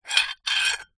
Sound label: household broken pieces ceramic dish edges scrape